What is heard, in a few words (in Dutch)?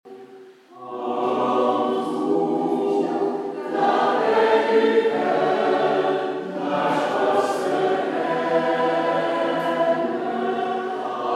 en tout la paix publike zingt mee; Coro Sine Nomine